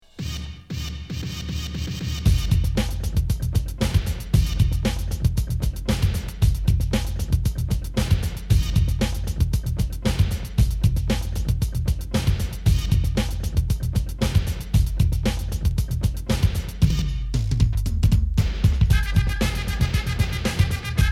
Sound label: beat; wicked mix vol 002